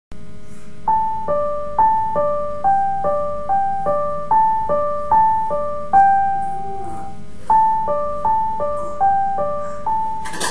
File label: river, piano